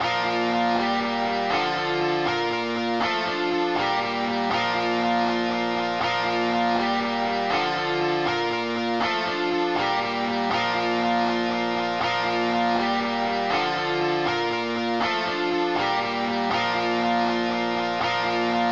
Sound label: overdrive